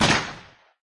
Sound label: desert eagle shot